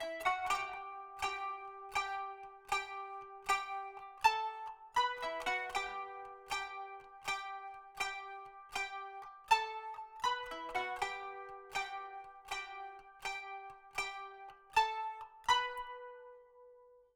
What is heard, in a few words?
kanun